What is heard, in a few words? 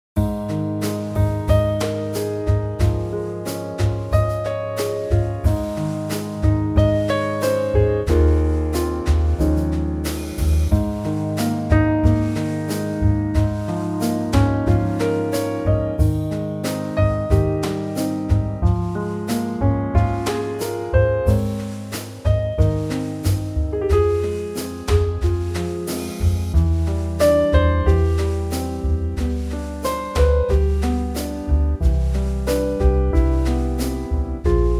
Ab Trio; key - Ab; Lovely Trio arrangement